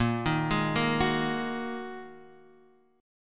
A sharp 7th Suspended 4th Sharp 5th, Guitar DADGAD chord; x,1,1,1,1,4